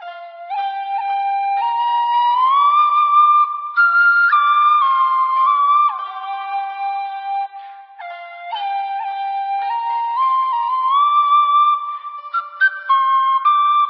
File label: chinese resto music; svencoop; chntwn